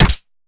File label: mace